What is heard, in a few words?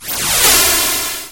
whoooooosh